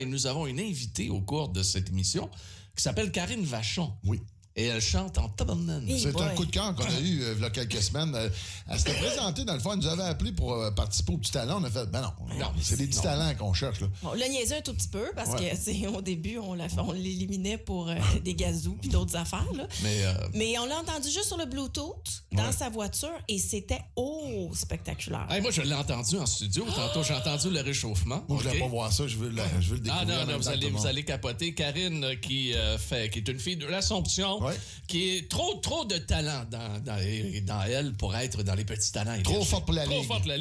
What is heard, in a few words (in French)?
MIDI